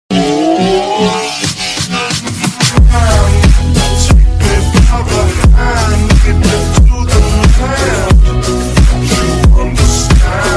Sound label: bass remix ringtone